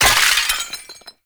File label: Loot Crate Burst; Ambience; Gameplay; Entrati; Warframe; Infested Eris